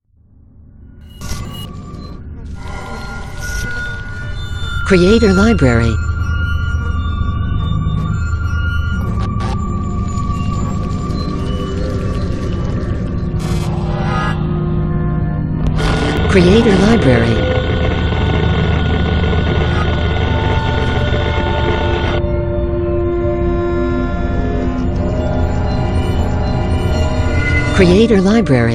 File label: Horror Intro; Logo-Idents